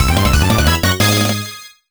Transforming Stone; the legendary starfy; nintendo ds

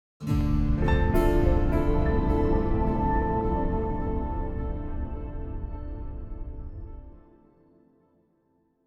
contemplative; Joe's Diner

